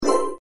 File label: bonus get; Danger Mouse - Ultimate